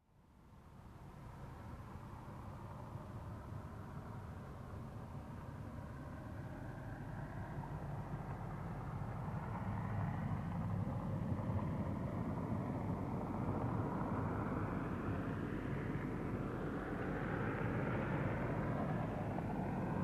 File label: Car approaching and parking